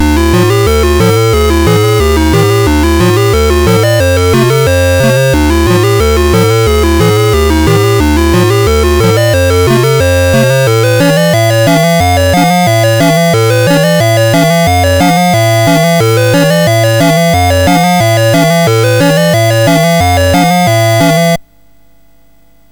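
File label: Mountain King, MOS 6581 SID chip music, games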